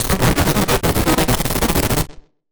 Casio, Glitched, malfunctioning synth

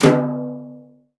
brush; Northstar - New Gold VOL-2; 50S BRUSH

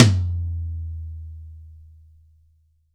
TOM-M, DRY+AMB TOM

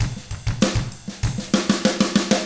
HARD ROCK, Drums; ILIO; Double Platinum Drums 1

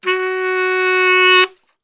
F#2 SAX, Brass Super Section; Best Service

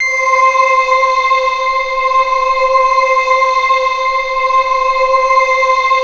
NIGHT C6, NIGHTFALL; Sound Gallery - Charged

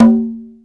LO CONGA SLP, HR-16 DRUMS; Greytsounds

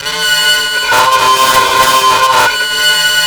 FUZZY LOVE, DISTORTORAMA; Spectrasonic Distorted Reality 1

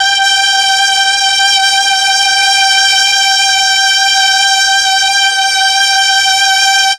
MEL STR, Legendary M400; ILIO; Propeller Island

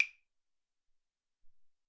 Blocks, Alesis SR-18

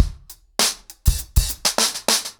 Drumkit 100BPM, ACOUSTIC DRUMS

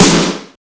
Distorted Snare; Yamaha PSR E305

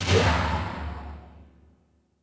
Crash, Yamaha RY20

